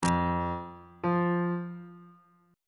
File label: g double flat note on bass clef